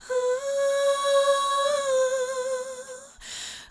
Phrase Uh High 130 bpm, Vocal; Loops; Tonal; Ableton Live 12 Lite; Core Library

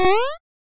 jump; Super Smash Land

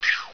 panel change; AIM6

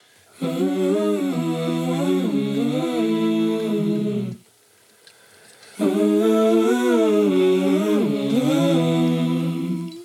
Vocal Hum; Loops; Tonal; Ableton Live 12 Lite; Core Library

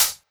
Hihat Closed; One Shots; Drums; Frantic

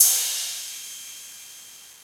Cymbal Machine; One Shots; Drums; Ableton Live 12 Lite; Core Library

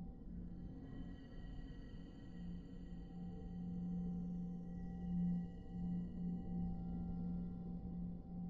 vision loop

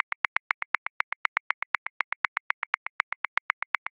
Soca Accent 16ths; Grooves; Ableton Live 12 Lite; Core Library

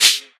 Shaker Burned; Drums

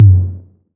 Tom 808; One Shots; Drums

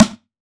Plastic Tube A2, Misc Percussion; Drums

